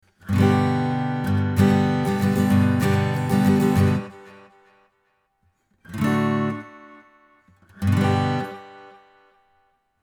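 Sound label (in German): Tascam Model24 A Git Schoeps Mk4 FX Delay, akustische Gitarre; Gain 3 Uhr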